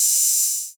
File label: 808 OHH